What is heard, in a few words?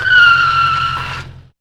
Speed Bike Screech Brake; Vehicles; Bikes & Motorcycles